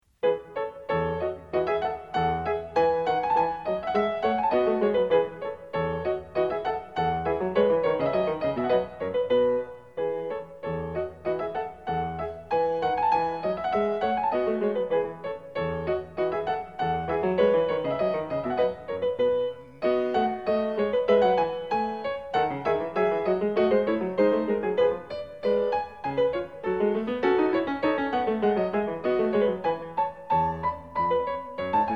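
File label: Bach French Suite 4 gavotte, piano; FRENCH SUITES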